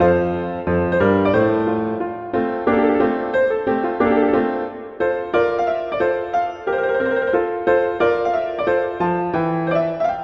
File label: Allegro; Haydn; Sonata 9; Hob XVI 9